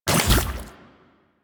Crafting Equip B; DE; Warframe; Deimos

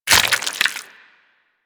Proc Bleeding; Mods

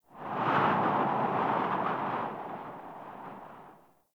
Prairie Day Wind Gust Soft; Ambience

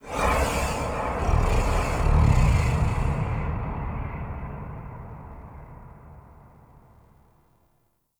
Sky Breaths, Ambience; Warframe; Duviri

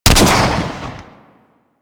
Swarm Missile Launcher Fire Shot; Warframe

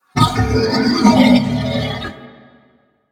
Horse Vocal Screech; Warframe; Vehicles; Duviri

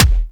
Weapon Punch Rifle

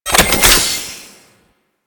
Container Unlock; Master Thief

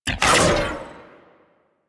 Arch Gun Alt Shot; Warframe; Archwing; Hildryn

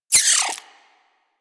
vocal pain, critters; Warframe; Hunting Critters; Nexifera

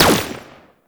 Deth Cube Prime Fire, weapons; Warframe; Sentinel; Deth Machine Rifle

